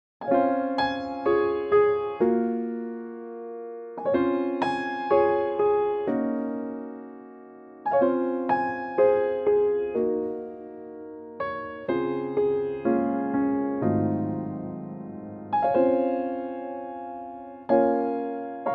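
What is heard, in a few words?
Db piano track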